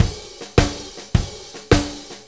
Blues Loop, Drum Tools, Full Kit Loops

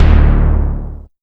primitive, Bass Analog & Synth techno; USB Soundscan vol.12; AKAI

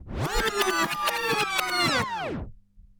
Turntablism; Sonokinetic - The Roots Drumloops

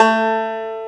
Banjo A; AKAI S-Series CD-ROM Sound Library VOL-2

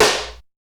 Snare; ZERO G - Datafile DISC 1

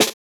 Snare, jungle breaks drum sounds; ORIGINAL JUNGLE BREAKS; JBK DRUM SOUNDS; JBK SNARES

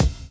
drum chop; AMG Gota Yashiki Groove Activator

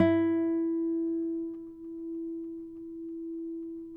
NYL E, FLAMENCO NYL; Spectrasonics; Hans Zimmer Guitars VOL-2

